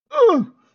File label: damage sound; gurps